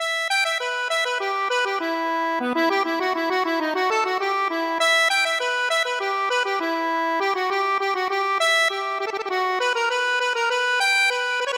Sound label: badinerie, accordion; bach